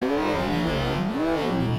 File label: cough long; garcello